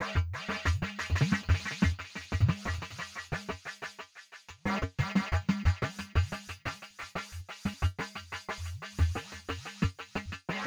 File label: Synth, Hip Hop Exotica, 090 Emin; Apple Loops; Big Fish Audio